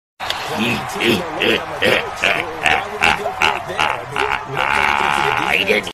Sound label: evil laugh; flight reacts